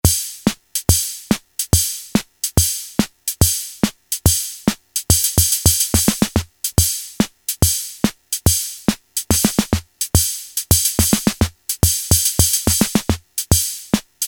swing, Casio MT-68 rhythm

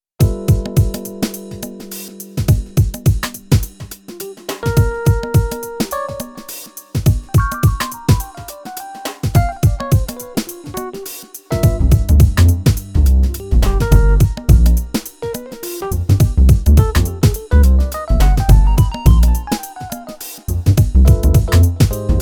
Acid Jazz, Korg Radias

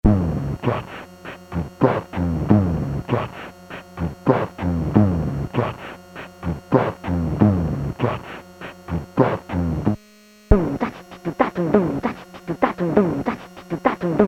loop, Casio SK-1 sampling

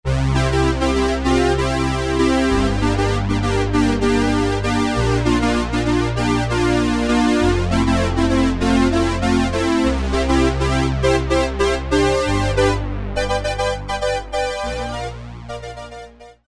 Fat Synth, Roland Alpha Juno-2; Factory A

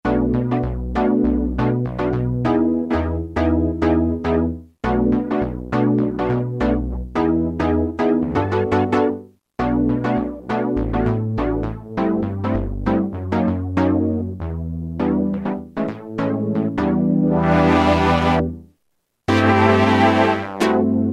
UK Swing Pad; Roland M-DC1

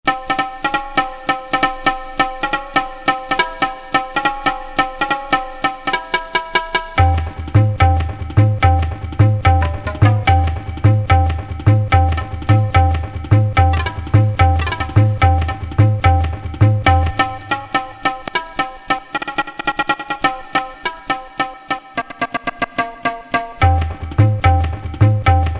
Rhythm, Indian Inst; Korg DSS-1

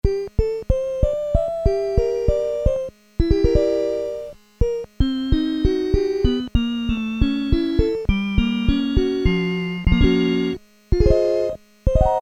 vibraphone, Casio SK-1; Harmonic component sound

